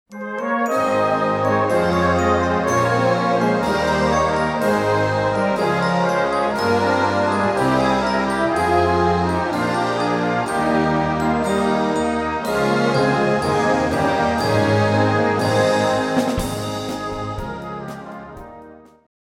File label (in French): extrait glockenspiel